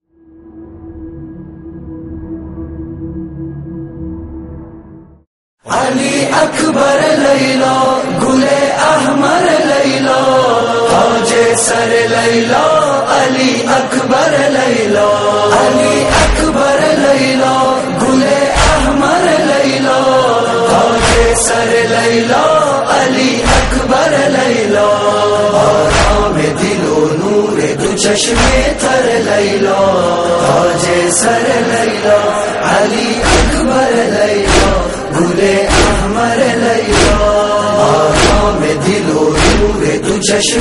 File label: Farsi Noha; Shahadat Mola Ali Akber